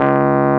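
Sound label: WURLY NO, Wurlitzer electric piano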